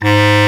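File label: Bass Clar mf G#2; Digital Sound Factory Virtuoso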